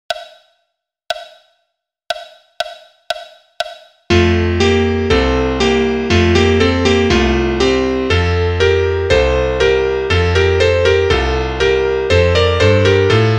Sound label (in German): Klavier; variationen ueber ein franz volkslied